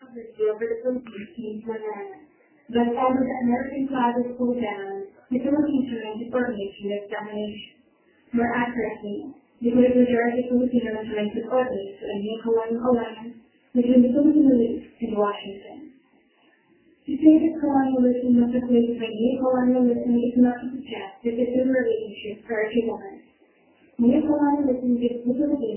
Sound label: classroom watching